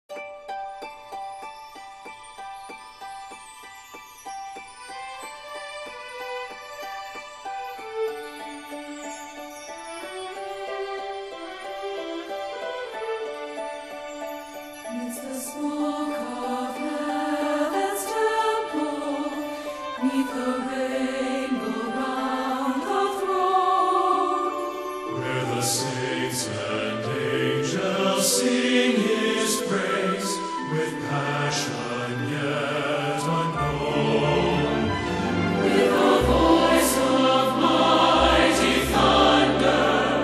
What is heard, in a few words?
Solo